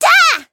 Voice attack

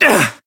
Voice damaged; shion

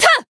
attack

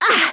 damaged; sherinu

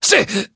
Voice damaged; zeviro

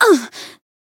Voice attack

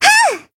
attack jp

